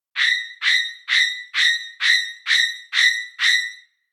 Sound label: Horror Pulsating Dissonant Strings